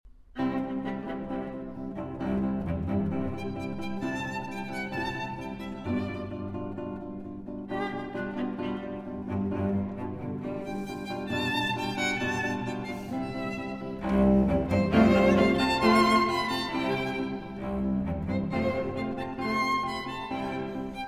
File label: Quartet in C Major, Moderato